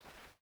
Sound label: footstep burlap cloth walk; Rust